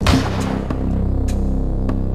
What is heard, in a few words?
death; singe